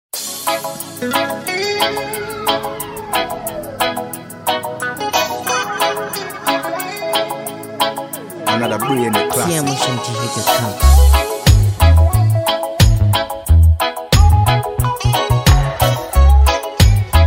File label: Mood Swing Riddim